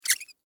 Metal Hinge Squeak, Foley, Metal, Hinge, Squeak; Latches; Objects

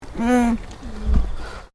Animal Alpaca Female Grumble; Alpacas on a farm in Gippsland Victoria; Miscellaneous Animal

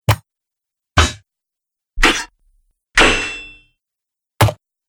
Sword And Weapon Hits; 5 Sword And Weapon Hits - Pack 3

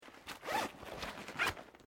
Backpack Nylon Small Compartment Zip Close; common day pack; Zippers (Sound Effects:Objects)

